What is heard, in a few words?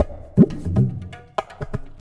JHAJJU, Tabla, 120BPM; Zero-G; Pure Tabla